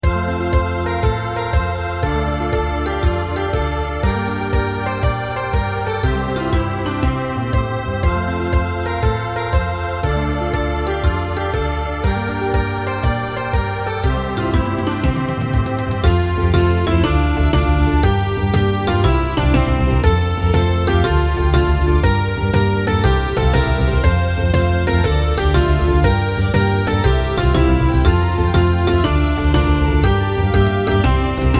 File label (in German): kinderlieder herzlich willkommen playback